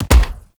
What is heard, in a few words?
Weapon Punch Bow; Warframe; Weapons; Bows